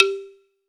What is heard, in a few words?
BALAFON G3, Africa